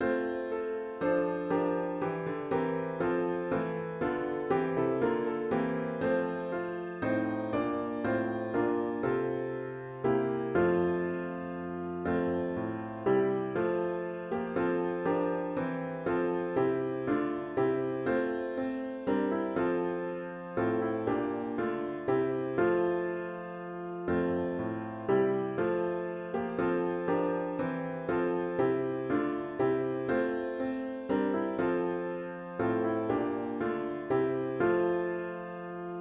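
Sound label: Hymn piano with Intro; worship service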